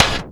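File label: snare drum, Drum & Bass, 160 BPM; Ueberschall; Drum & Bass Flash Trackz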